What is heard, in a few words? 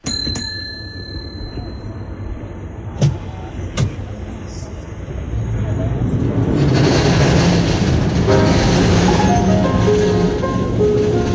San Francisco Tramway